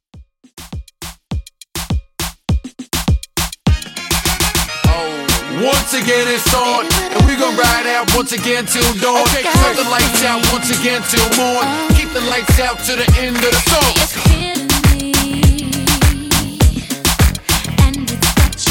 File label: Reggaeton 102 Bpm